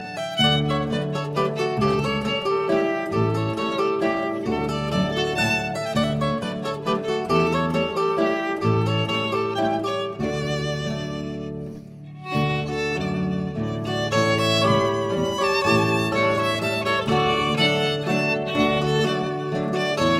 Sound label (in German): Steyrischer